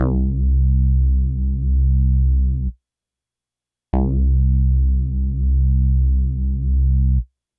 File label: bass, yamaha reface cs